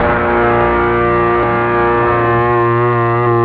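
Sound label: electric guitar P4th; jazz jackrabbit 2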